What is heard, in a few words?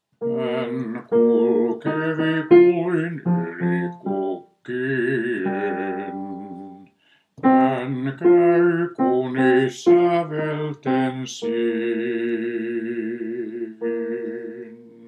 Han kulkevi basso, stemmat miehet; 2. basso; Vetelin poikakuoro